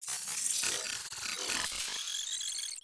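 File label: ui Arena Select open; Star Wars Obi Wan; XBOX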